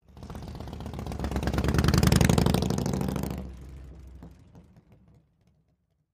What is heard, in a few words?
Harley driveby